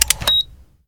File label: thermal; gmod; masita explosive expansion workshop content